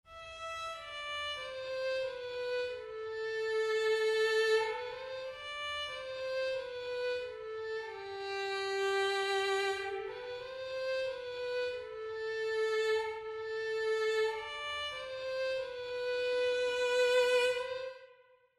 Canone chiuso

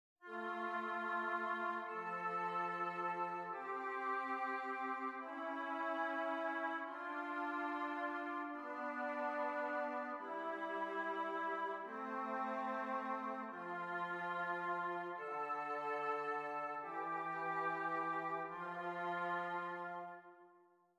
esercizi di contrappunto semplice A due voci

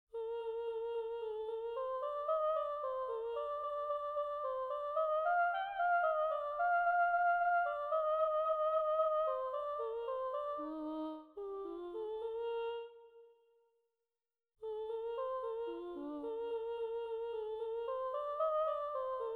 canone a tre; salieri

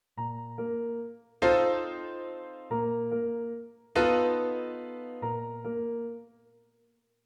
Allegro

